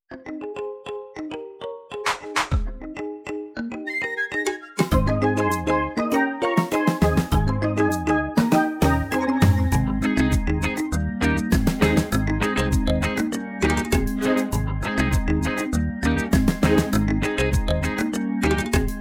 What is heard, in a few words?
Version instrumentale Sans choeurs; La Petite Sirene film 1989